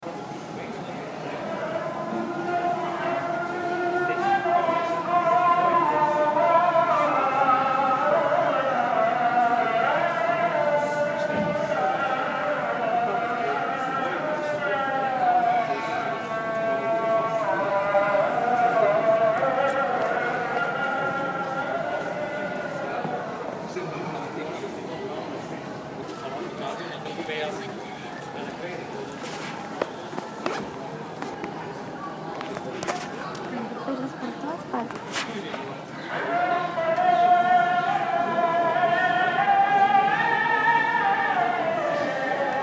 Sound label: Muezzin Flamenco Istanbul